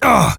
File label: pain; player